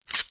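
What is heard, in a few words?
enemy attack gun charge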